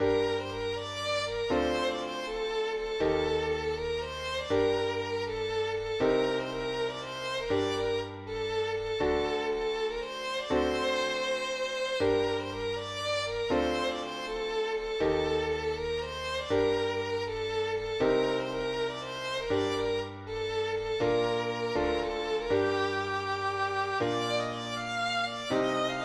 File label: mazurka